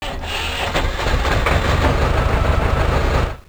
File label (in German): LKW startet